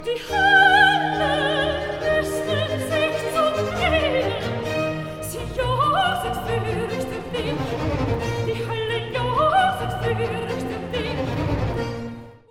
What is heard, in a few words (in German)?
Arie Soprano; Die Hille rAstet sich zum Kriege